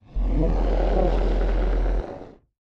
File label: werewolf; soundscrate